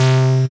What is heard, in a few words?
SYN, Synths; M8; Electronisounds